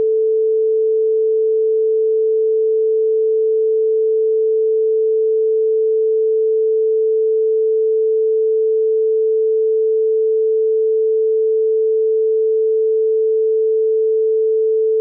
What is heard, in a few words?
440hz test tone -12dbfs; 48khz 32bit float